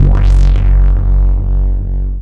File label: Prophet, Basses; AMG - Prototype Drum & Bass